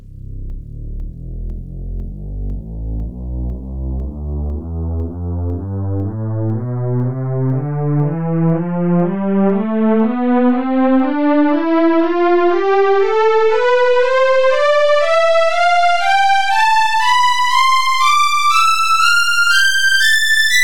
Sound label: warm pad tonleiter